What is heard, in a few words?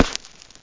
HIT